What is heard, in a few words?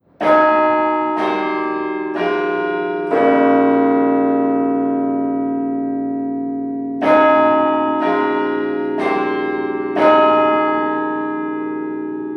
Carillon Westminster Quarters; UM; Baird